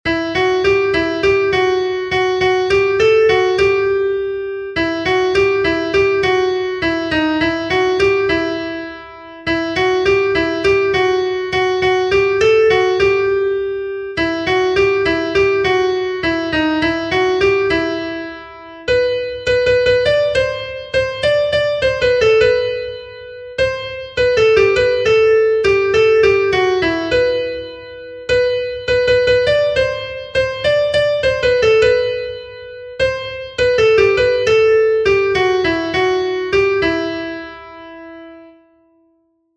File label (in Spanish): SOPRANO, himnos en piano; 36 ALABANZA; cantos del camino